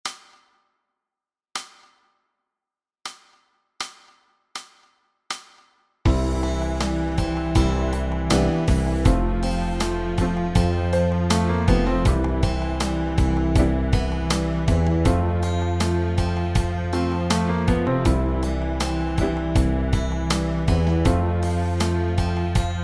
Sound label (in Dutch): Dromen backingtrack